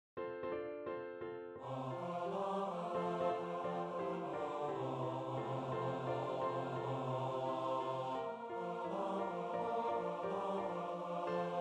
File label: Cowboy carol BAS